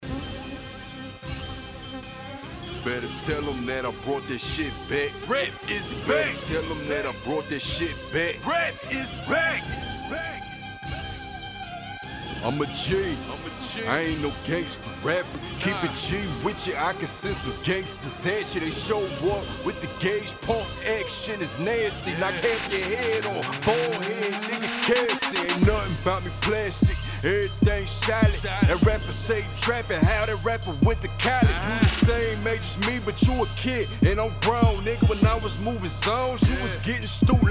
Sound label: Hip Hop Unmastered